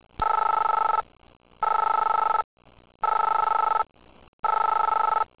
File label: TEL DIAL; Talking E-Mail